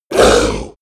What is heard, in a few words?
orc hit; kreaturen